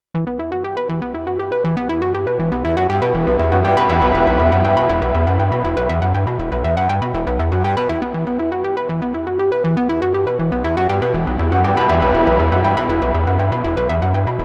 UNO Synth Pro X Presets

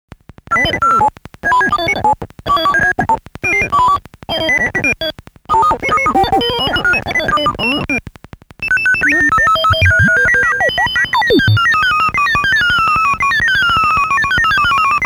Talking Synth

